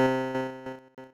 synth, amiga med

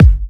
Electro Kicks; Vengeance - Essential Clubsounds Vol.4; Bassdrums

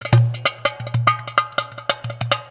PERC, Gipsy; Best Service; Ethnic Journey Sound Cube

